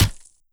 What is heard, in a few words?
ric grass, weapons; svencoop